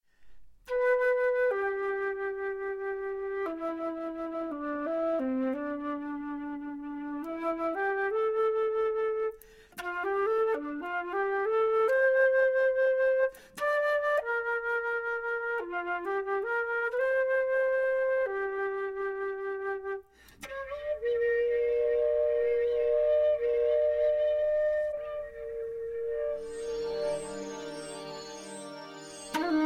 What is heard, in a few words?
voices, Flute Settings